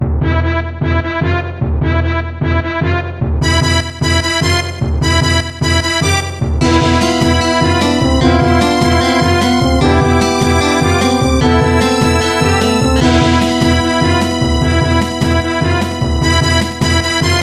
Demons Struggle; Demon Peak

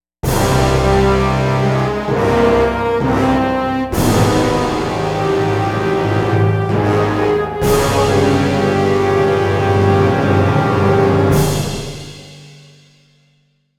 Fail; Heroes Over Europe

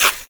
Spinning Spear Throw; blastmorph pinball; xbox series xs

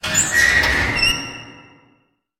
Jail Door Begin Open

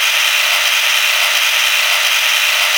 truck move

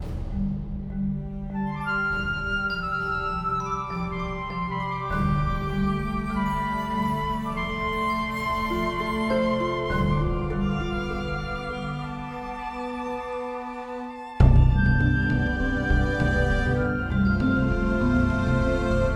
peaceful beach; pirate mysteries android ios macos mobile windows gamerip 2012

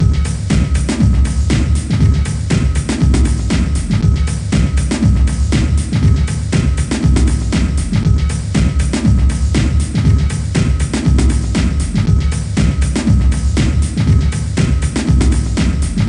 Ditty; A Building Full of Cats

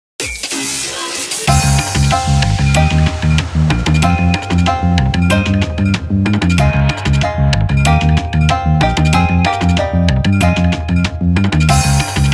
ELECTRONIC GEAR; Rolling Contact

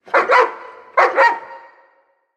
Dog Chase Target

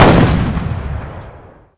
cannon shot; pirate room sfx; birthday party bash; wii

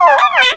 bug; Birthday Party Bash; Wii; bug catcher

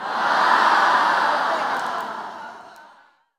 reaction; Birthday Party Bash; Wii

